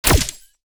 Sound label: imp staff cannon